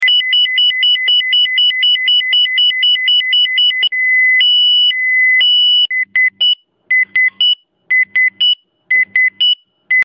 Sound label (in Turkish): Saat alarmi